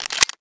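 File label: magazine in, G17; weapons; halflife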